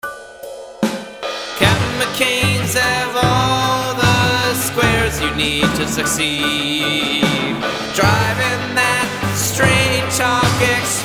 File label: jingle; mccain